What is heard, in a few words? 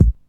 clear kick sample A key